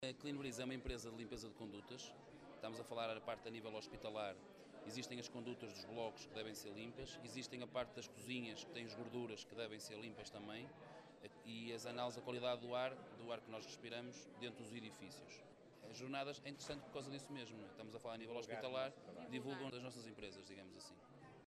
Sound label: clean breeze